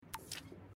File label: check sms; life is strange